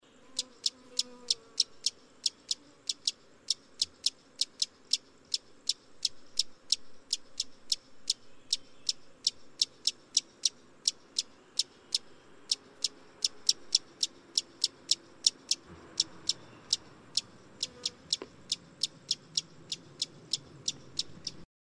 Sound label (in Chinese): 綠啄花; 純色啄花鳥; 啄花科/留鳥